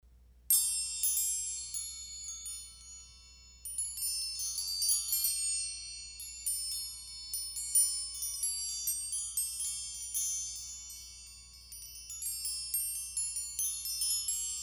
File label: Tree of Life Chime Ringtone; Woodstock